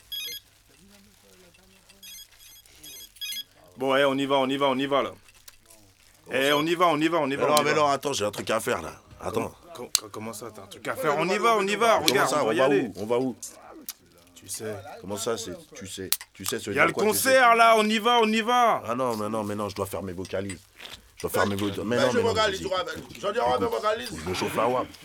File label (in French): Pause vocalise